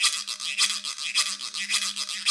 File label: Guiro Wood; Club-50 - Foundations Roland